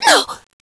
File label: pain; cstrike